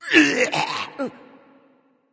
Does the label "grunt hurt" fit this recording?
Yes